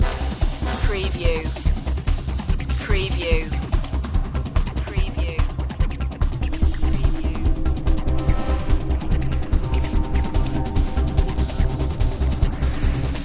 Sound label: urban takedown loop